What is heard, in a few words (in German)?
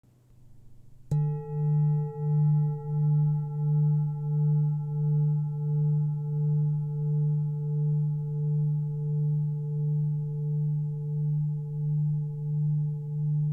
Doppeldorje Klangprobe